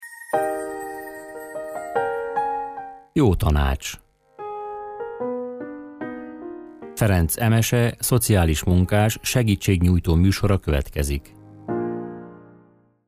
Szignal; Jotanacs

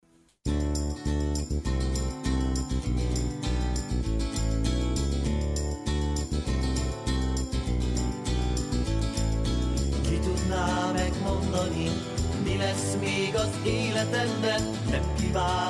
Vege J; A hit kapuja

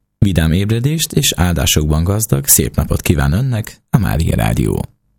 Reggeli J

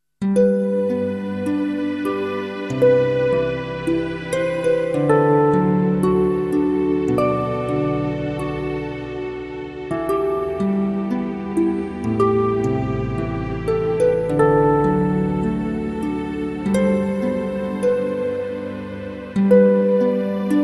JINGEL, lassú, nyugodt; az Imatarsolyhoz; IMATARSOLY-ZENE

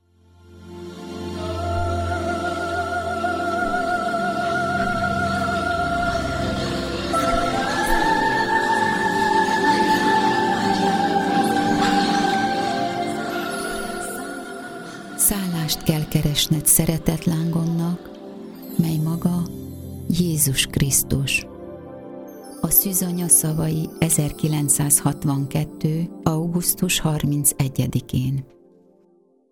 uto jingle; Szeretetlang

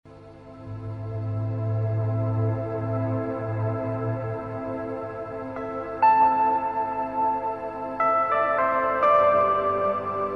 jingle eleje rovid; Lelekido

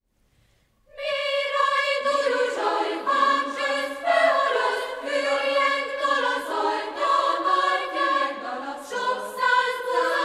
Békedal

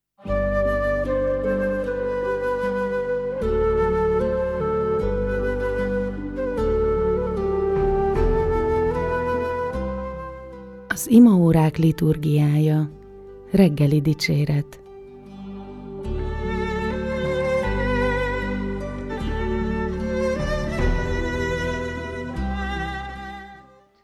REGGELI JINGLE

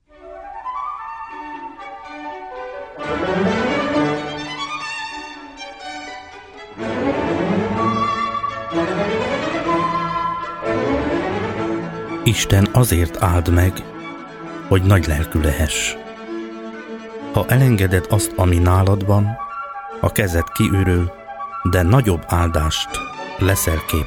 Pénzkérő Jingle

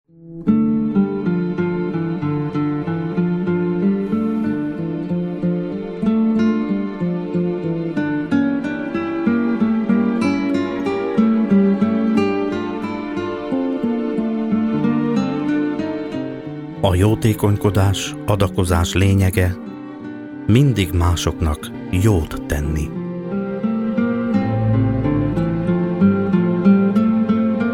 Pénzkérő Jingle

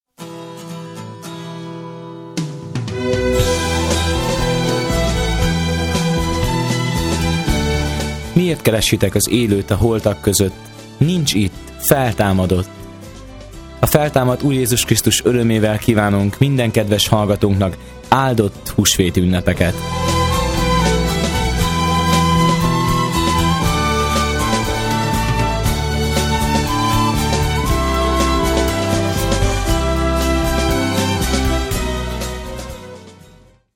Husveti Jingle; Husveti Szignalok

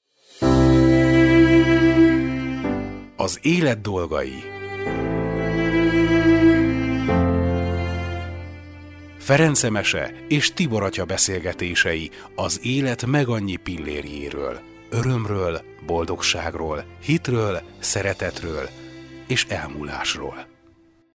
Szignal; elet dolgai

